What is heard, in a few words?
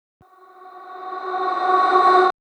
Chorus REVERSE FX, 144 BPM; Vengeance Samples; Set Free